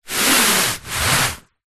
sport exercise mat foam thin shift slide scrape movement on carpet